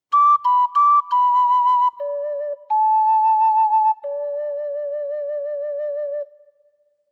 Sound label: Ocarina